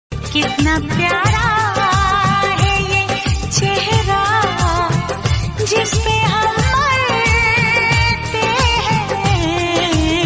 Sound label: female hindi ringtone